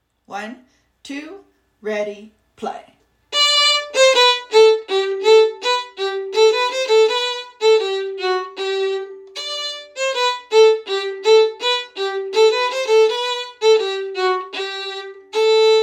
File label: Deck the Halls